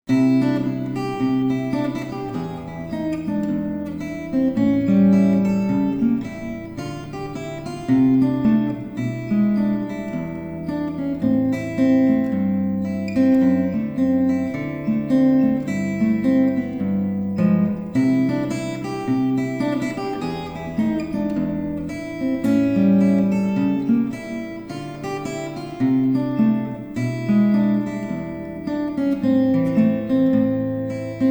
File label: blues